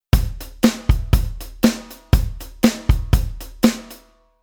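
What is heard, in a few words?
Rhythm Snare Drum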